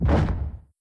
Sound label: step; sven; bull